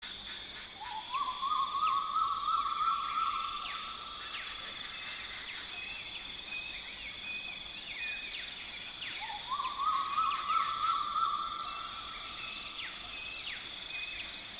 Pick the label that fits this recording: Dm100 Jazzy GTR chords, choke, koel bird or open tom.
koel bird